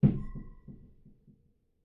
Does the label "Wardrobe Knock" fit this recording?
Yes